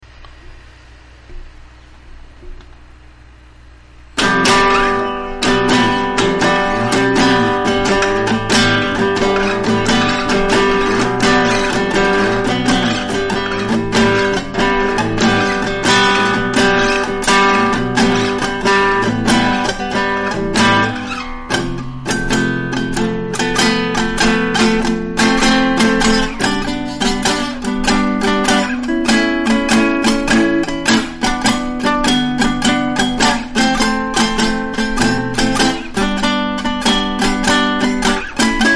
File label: acoustic